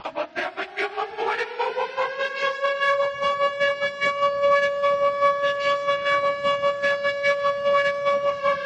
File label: thunk siren; phonk